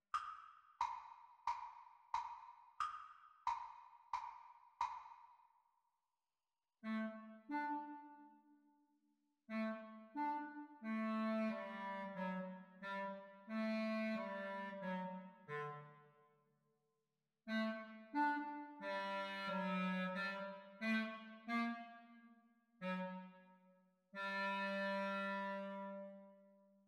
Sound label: Exam Recording Clarinet Duets; Grade 1